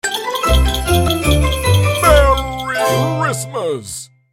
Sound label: Magical merry christmas festive celebration